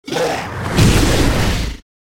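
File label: Lizard buff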